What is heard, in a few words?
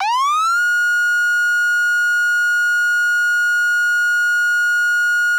Federal Signal MS4000 Airhorn